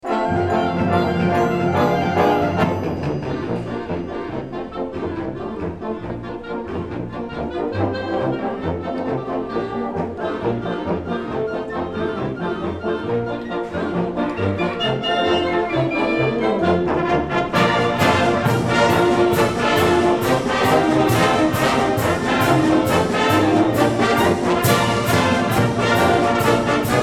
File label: Russian Polka